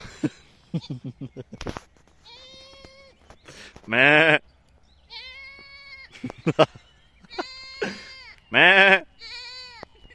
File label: goat; severin